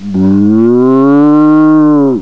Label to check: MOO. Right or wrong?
Right